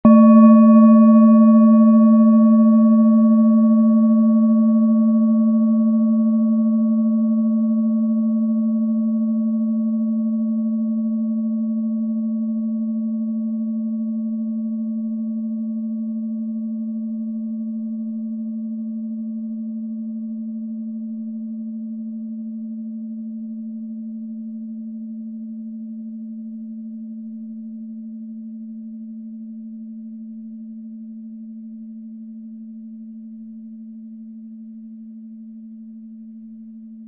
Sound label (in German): klangschale eisen